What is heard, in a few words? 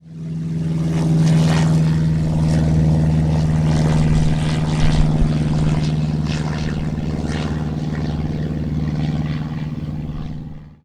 Airplane; vehicles